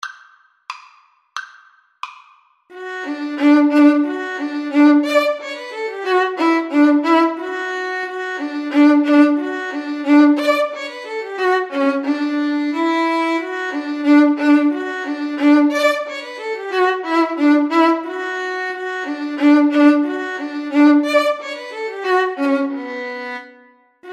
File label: violin duet